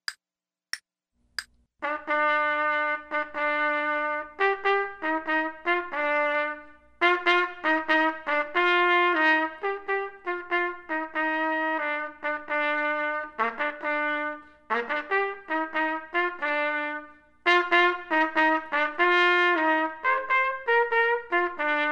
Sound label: trumpet; Selected Duets for Trumpet Podcast